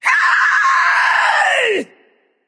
battlecry; smite; nemesis

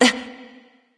surprise; smite; voicepack; skadi

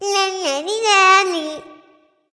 tongue; smite; voicepack; scylla

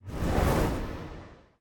body swish; smite; thanatos

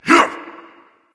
grunt attack; smite; thanatos

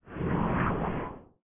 float; smite; thanatos

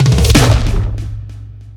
fire; smite; god raijin

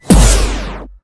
umbrella trick; lilmana; smite; vamana

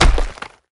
clap; smite; geb

